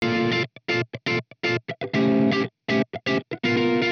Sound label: amp guitar funk; souldriven; Morphdrive